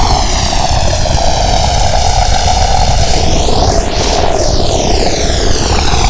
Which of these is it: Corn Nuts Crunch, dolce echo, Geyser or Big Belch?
Geyser